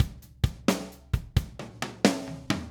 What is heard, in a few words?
Drums, Rock